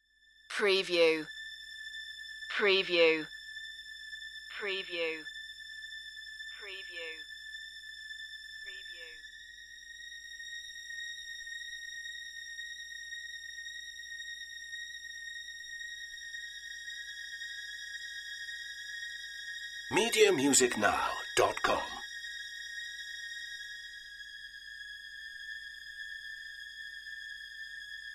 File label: courtroom thriller high strings suspense